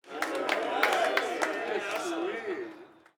street ball crowd cheer low; Crowds

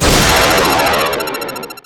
Rocket Launcher Shot; SFX Kit; Weapons

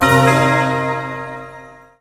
Pickup Magic; SFX Kit; Pickups

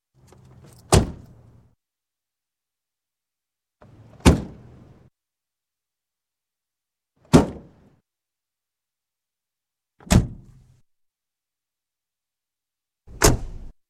Car Door; Sound Ideas; Series 2000

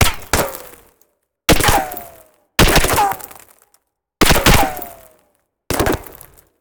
Bullet Ricochet; Sound Ideas; Guns

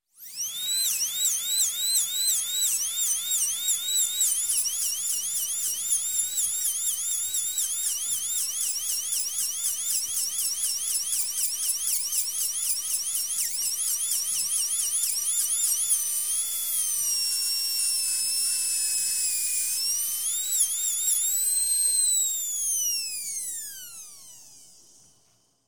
Dentist, Drill Drill Running; Sound Ideas 2000 Series

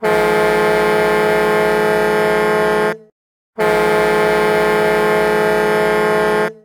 Hockey Goal, Hockey Horns & Buzzers; Buffalo Chicago; SFX Kit

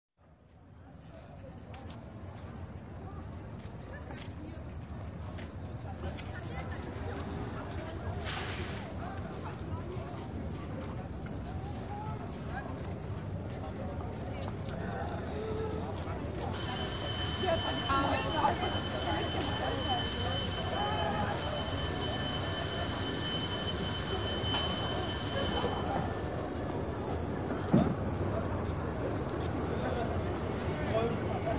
School Children Ambience; Sound Ideas; Series 2000

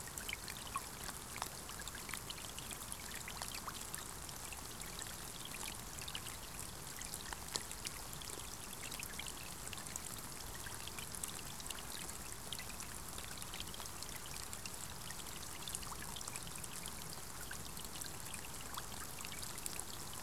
Rain on puddle light; boom library; Thunder And Rain

